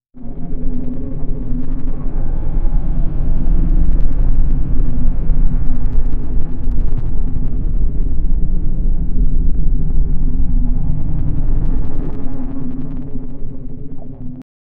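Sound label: plane turbulences cockpit; aircraft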